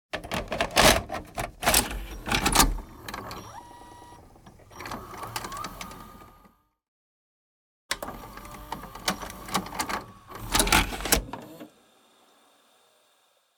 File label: VHS Tape Machine Tape In Out; noise creations